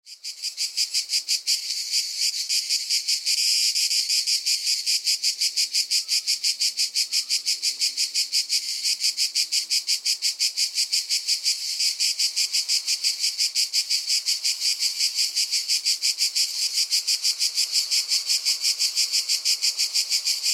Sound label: Cicada sound effect